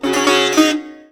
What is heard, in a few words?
SITAR GRV, World Colours; Best Service